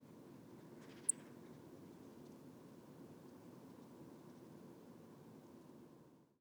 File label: simple short intro